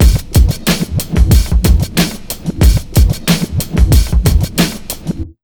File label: 92 BEAT, Pure Electro, BEAT MIX